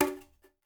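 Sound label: brush conga; Spectrasonics; Liquid Grooves 1 & 2; CONGAS+BGOS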